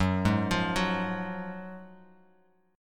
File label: F sharp Major 7th Suspended 2nd, F sharp, down, Bass Standard; arpeggiated; JGuitar